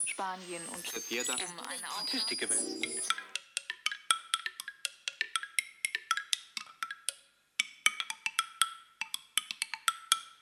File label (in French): Radio Xylophone